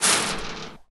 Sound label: chainlink, Footsteps; zps; Foley